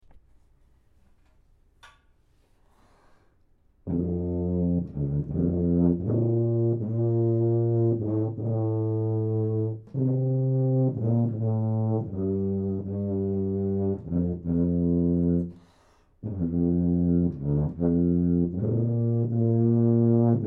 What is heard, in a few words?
tuba